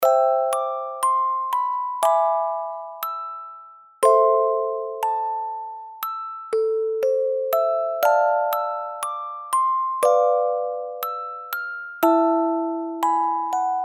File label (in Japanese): オルゴール